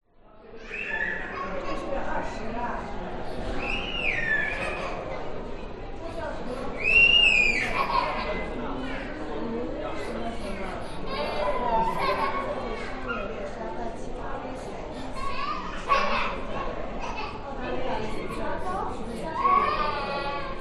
public places museum busy parents children child crying int brisbane